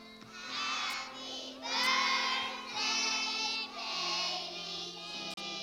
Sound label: problem audio vx2100